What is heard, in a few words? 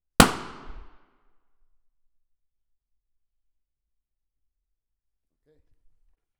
reverb Hall near back entrance; Pomona; Bridges